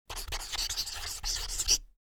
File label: writing signature